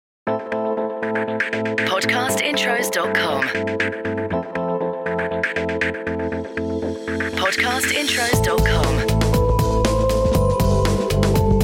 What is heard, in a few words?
BREAKBEAT Forward Motion; Podcast Watermark